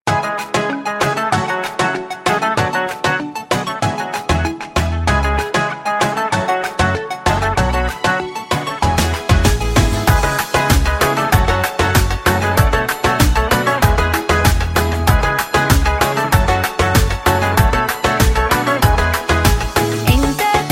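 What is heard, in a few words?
oriental instrumental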